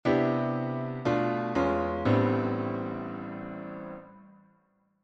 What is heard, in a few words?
I - vii - III - vi, gospel piano; ii-V-i mineur vers le vi : I-(viib5-III)-vi; L'accord E7 correspond à un accord de dominante pour le Am ( Il s'agit donc d'une dominante secondaire ); Enchainements d'Accords